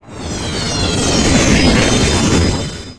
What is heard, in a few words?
impact razor; Earth and Beyond; EA Games